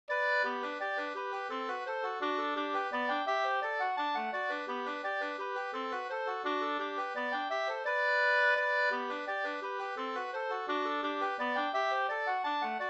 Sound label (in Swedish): vals; Spel-Borgen